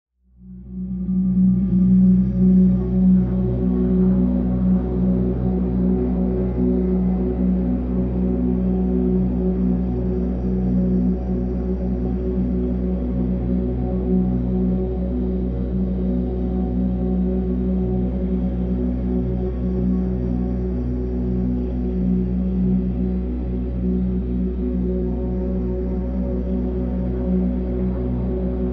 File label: Space Ambience Atmosphere